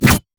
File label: crit attack; LoL; sion